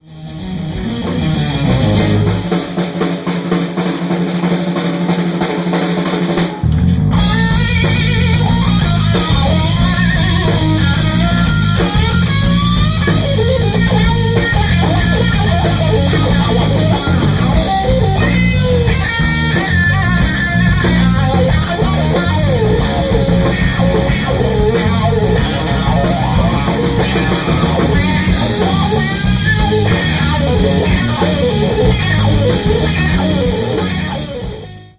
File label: Gypsy Wah; Chase Tone